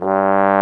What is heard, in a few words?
BRS BASSBO, Trombone; Roland L-CDX-03 Disk 2